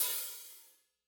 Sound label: Hi Hat Open